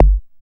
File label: BD ANALOG; Sound & Vision - Gigapack II CD 2